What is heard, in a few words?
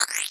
Multimedia Internet CD-Rom Flash Wipe Short